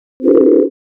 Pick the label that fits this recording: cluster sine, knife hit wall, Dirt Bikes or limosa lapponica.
cluster sine